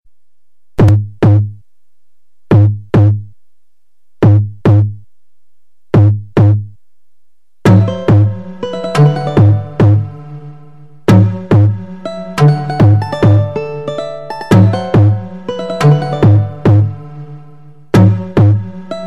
Drum Beat

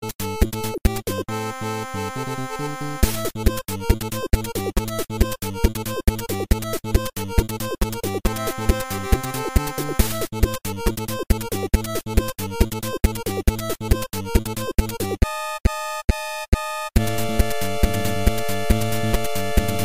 8-Bit; Mortal Kombat

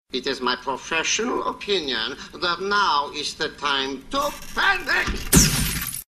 Panic

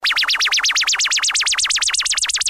Elektronik

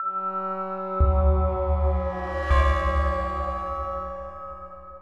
Evil Bell

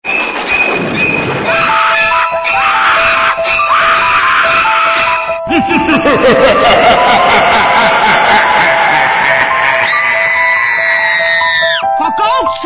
Spooky Mix

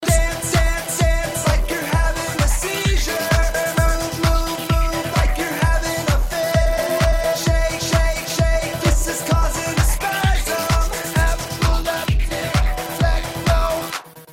Techno

